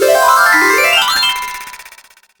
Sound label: win